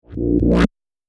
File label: Low Resonant Laser Zip, sci-fi laser sound effect